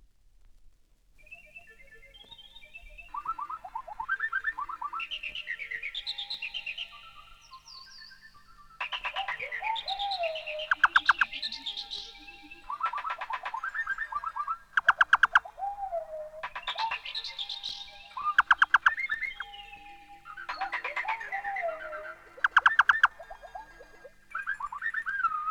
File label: Bird Chorus; tomitas birds